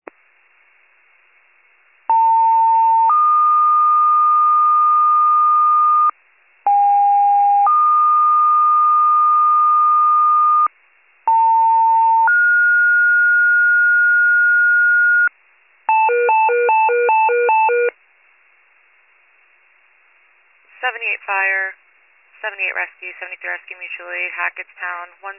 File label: FIRE pager alert